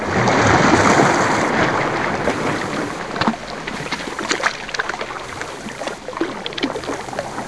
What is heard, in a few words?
water gurgle